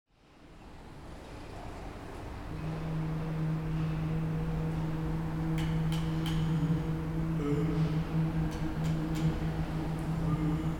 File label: Group Recordings Stereo Ambisonics